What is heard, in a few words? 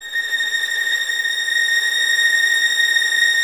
Violins Tremolo A#6; Miroslav Vitous; 11 Violins